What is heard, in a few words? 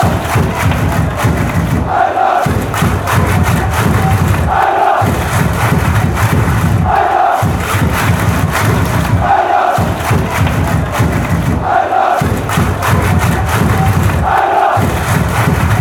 claps, fanchants; eintracht